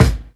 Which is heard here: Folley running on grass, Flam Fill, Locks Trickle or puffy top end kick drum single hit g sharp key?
puffy top end kick drum single hit g sharp key